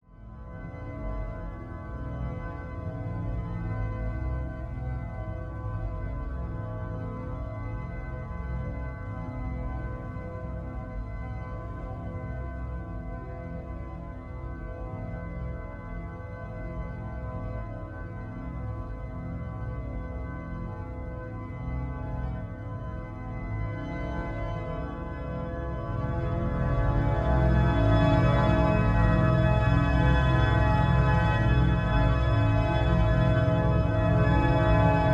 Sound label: deep to arousal organ